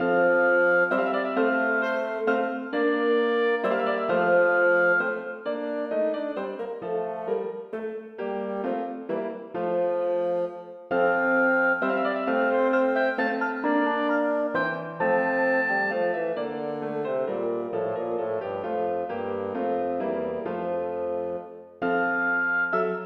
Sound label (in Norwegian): Menuet for obo, fagott og piano; Aamodt